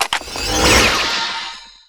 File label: spell pickup rare